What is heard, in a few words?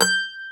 MANDOLIN; E-MU Producer Series Vol. 2; More Studio Essentials; Composer